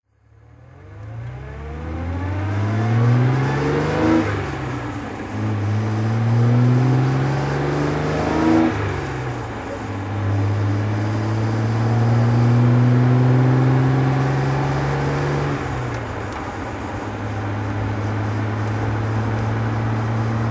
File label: healey 3000 mk2 driving